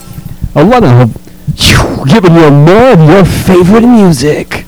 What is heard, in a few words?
old vocals